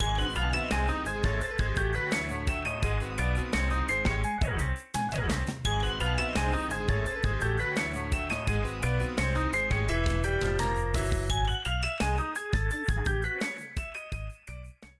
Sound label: super slot; BALLY; music; TWILIGHT ZONE; Pinsound; Twilight-Zone-Full-Reorchestration